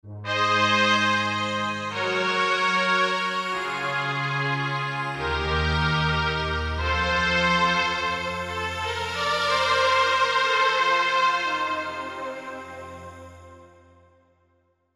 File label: Elec. Wire, Korg MSC-11S Brass